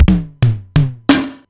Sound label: Simmons SDS1000